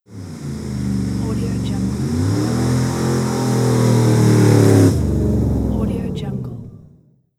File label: race car tire burn out; electronplanet